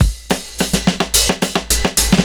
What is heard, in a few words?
FILLS, 70_s Breakbeats